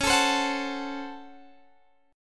C sharp Major 7th Suspended 4th Sharp 5th, C sharp, Guitar, Drop D